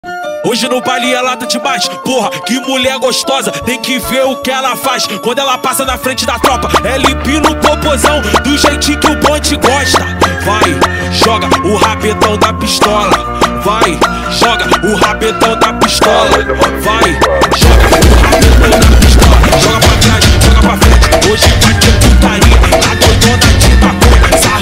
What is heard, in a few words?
funk